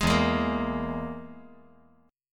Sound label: E Major Flat 5th